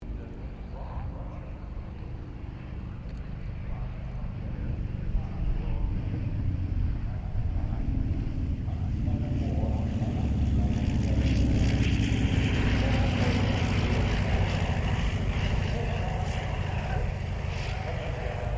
2000 SUKHOI SU-26M LAND, SU-26m Acrobatic aircraft; Airplanes; Vehicles